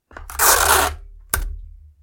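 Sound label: scotch tape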